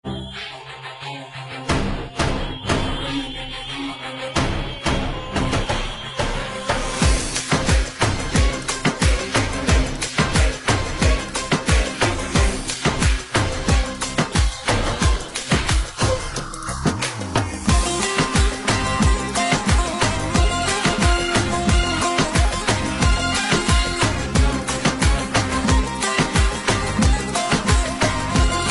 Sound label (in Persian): ahange bi kalame; turkiey; آهنگ های بی کلام گیتار ترکیه ای